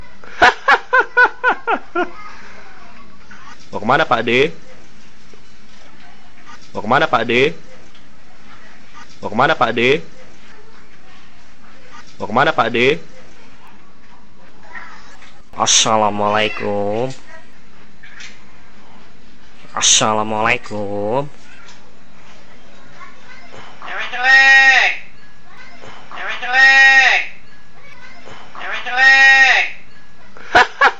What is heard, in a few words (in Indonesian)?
beo latihan